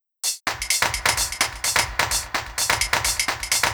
128BPM Drums No Kick, techno; drum fx; Beats

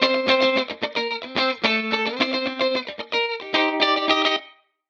Guitar Line, 110bpm, hiphop; musicradar

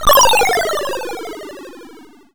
YM Fall Aaah; SFX; ChipShop Deluxe; ChipShop Hits

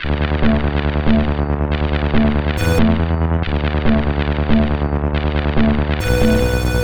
140 Synth D, 140 BPM; loops; CHIPSHOP DELUXE

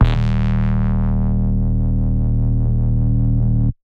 Tech Bass D#0, techno 120bpm; musicradar; Bass Multi

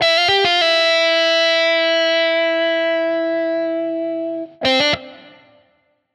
Guitar Line, hiphop; 85bpm; musicradar

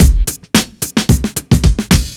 Beat 110bpm; musicradar

